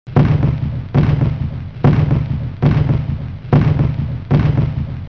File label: cannonfire